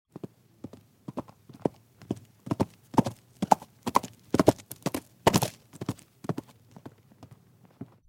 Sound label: horse galloping; wilder westen; dragon studio